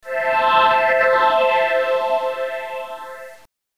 SMS Ringtones

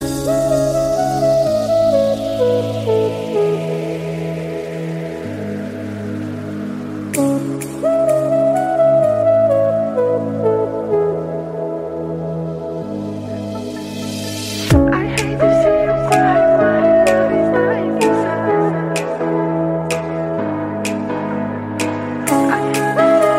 iphone xs original ringtone; apple

